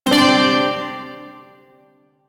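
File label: Shawzin Pentatonic Major Chord; Warframe; Duviri Shawzin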